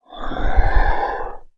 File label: moan